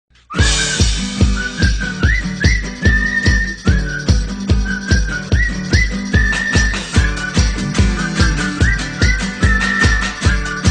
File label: Fiu Fufufu Fiu